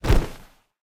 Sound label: body fall; smite; pele